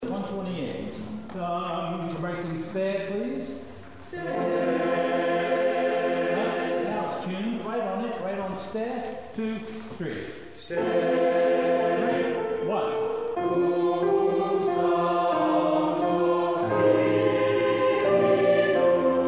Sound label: Choir 3 only; rehearsal files